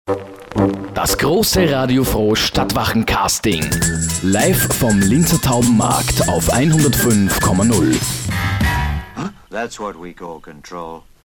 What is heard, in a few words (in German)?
jingle